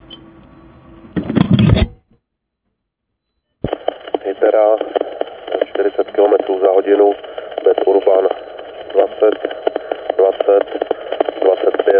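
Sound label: 5km Bad Urban analog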